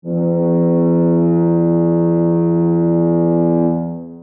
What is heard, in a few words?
foghorn